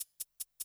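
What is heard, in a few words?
LOOP shaker, Drum & Bass, 150 BPM; Ueberschall; Drum & Bass Resonance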